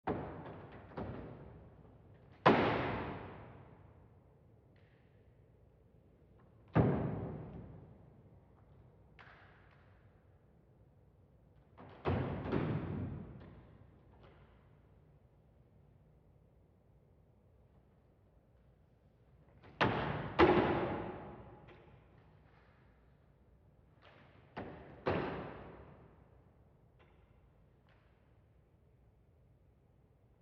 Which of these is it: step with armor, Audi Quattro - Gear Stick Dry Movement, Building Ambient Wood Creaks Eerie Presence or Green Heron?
Building Ambient Wood Creaks Eerie Presence